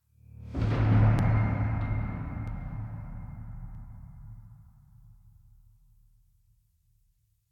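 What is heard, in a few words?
Metallic low frequency effects; Warframe; Ambience; Nineteen Ninety Nine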